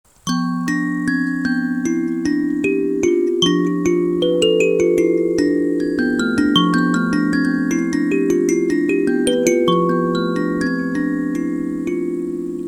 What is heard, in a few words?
21tone Fmajor Kalimbas; Shae; BolfKalimbas